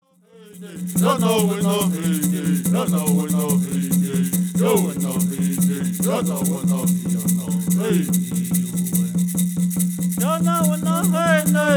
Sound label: Peyote Song